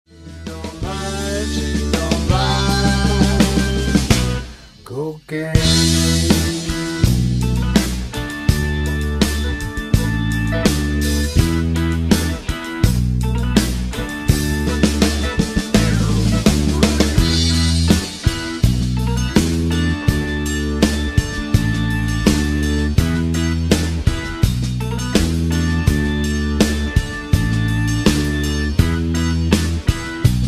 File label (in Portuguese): backing track SEM GUITARRA SOLO LENTO